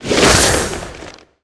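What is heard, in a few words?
Ghost Knight Attack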